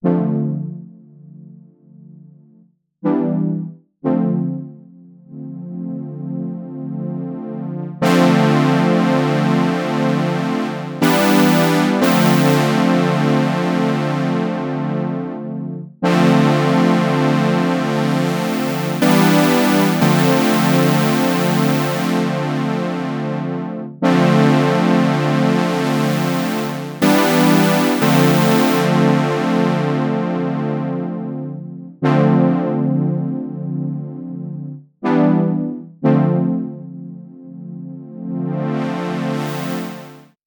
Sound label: OB X Soft Brass; Sonicprojects OP X PRO 3